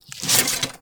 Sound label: arrow grab from quiver, Medieval Weapons Sound Effects